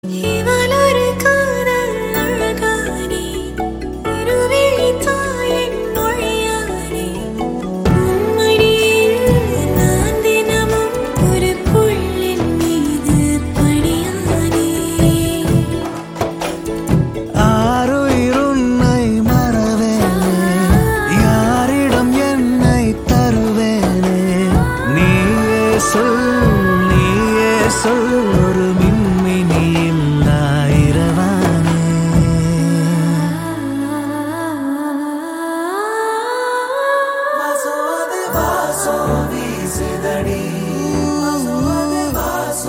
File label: Female Ringtone, Tamil; Galatta Kalyaanam